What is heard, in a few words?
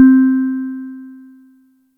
Glocken L, Yamaha DTXpress misc voices